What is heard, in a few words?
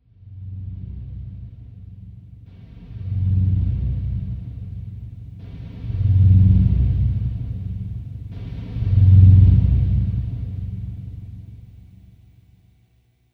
Marimba and Drums Mood; gamerip; the last of us